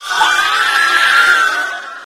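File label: Witch scream; guns n zombies 2014 pc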